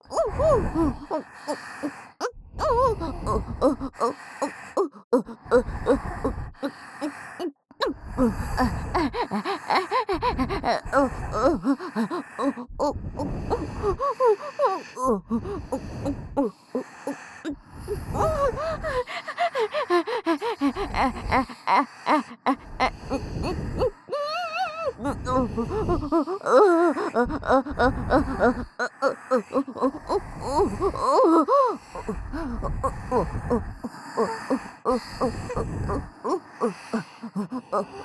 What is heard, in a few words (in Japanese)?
オホ喘ぎ ＋ 耳元発情吐息; おまけループ（オナサポ）; 3WAYループ; SE無